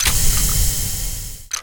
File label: laser vent